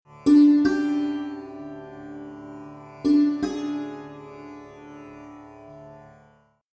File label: Swaras Ma Drone; ragas; ragajunglism